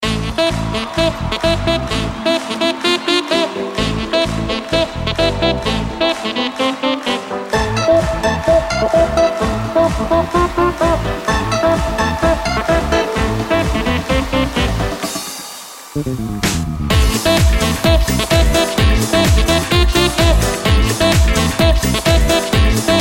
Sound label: Saxy House